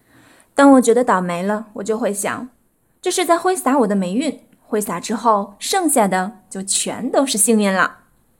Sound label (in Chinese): 哈哈; 挥洒霉运